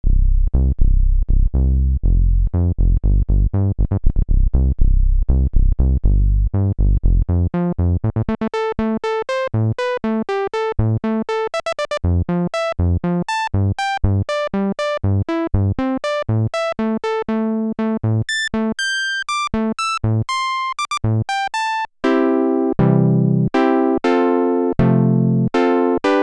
roland juno-106 filter lpf q000

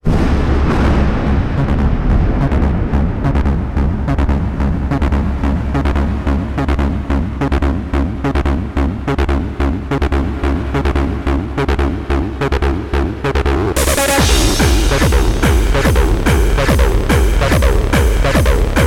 acid knock out

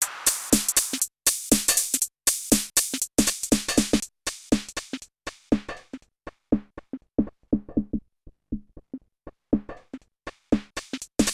yamaha tg500 sounds drumkit

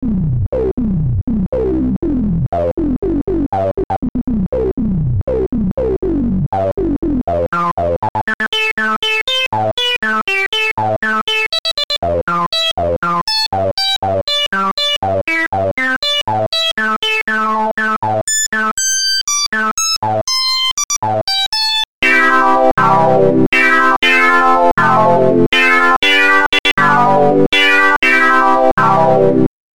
imposcar vsti filter lpf q100; gmedia

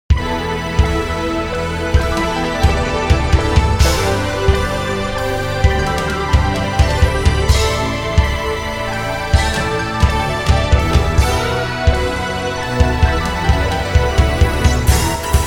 technics sx-wsa1r, dance contemporary

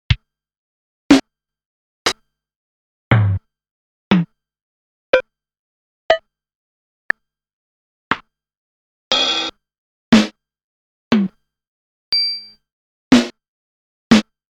casio ctk-450 samples drumkit

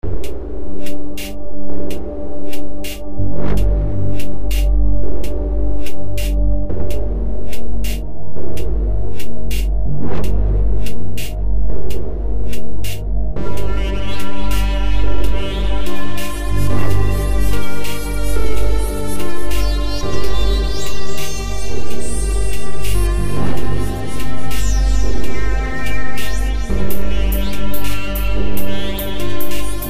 vsti, sounds; sinnlos EVOC, ES2, FM7, Drumatic Delay, Reverb by Logic; VST-Software generated demos

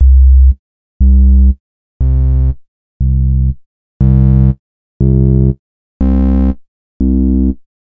yamaha ys200 samples 8 waveforms

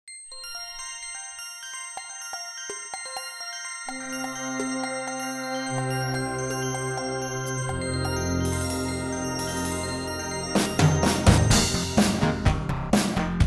yamaha tg77; factory demo

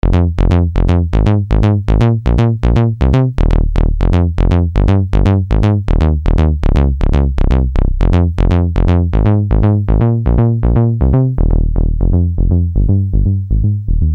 ensoniq mirage dsk-8 sounds, bassline

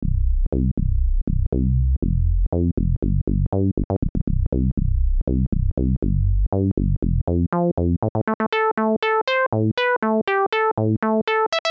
reaktor 5 vsti filter lpf pro52 q050; native instruments

